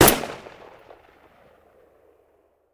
Rifle; Sound Ideas; Guns

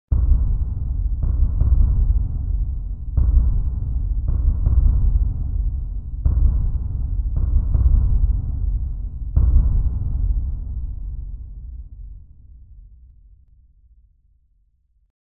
Impact Deep Drum Hit - Deep Rhythm; Hollywood Edge; sound designer's toolkit v2

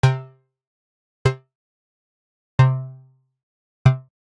Digital Click Neutral

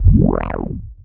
Menu Synth Tone

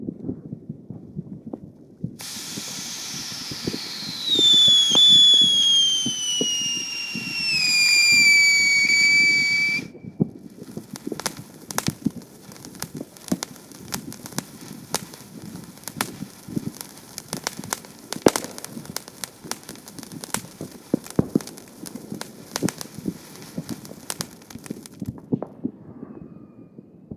firework; best service Blue Box

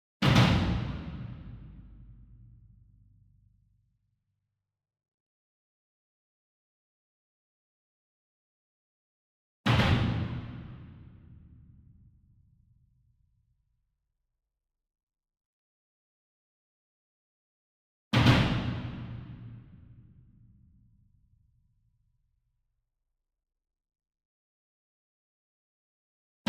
SINGLE DRUM Verdi 66 Inch Double Hard Beater Far; boom library; Cinematic Strikes Construction Kit

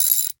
Rachet; Tools; 1001 Sound Effects

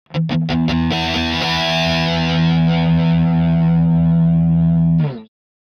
Guitar Heavy Metal Finale Chord - Long; Hollywood Edge; sound designer's toolkit v2

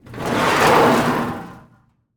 Morgue Drawer Slide; Medical; Blastwave

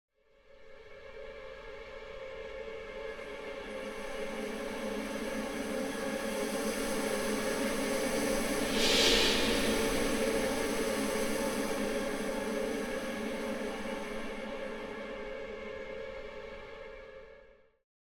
Symphonic Pad Up & Down Tension Pad; Hollywood Edge; sound designer's toolkit v2

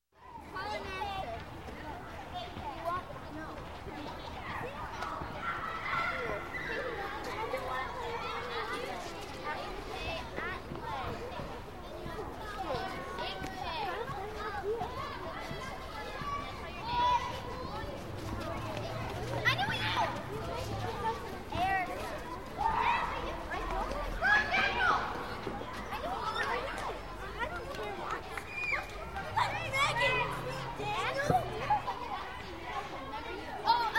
Children Schoolyard - Playing And Yelling; Ambience; Sound Ideas 2000 Series

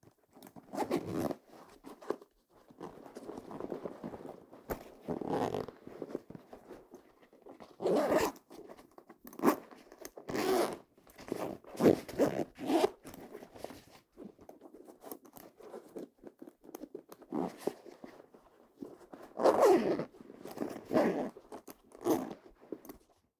backpack zip up; human; NS Studios - ultra sound collection

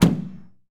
Ferrari Dino Hood Open, Automobiles

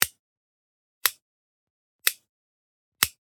Generic Click Metal Small

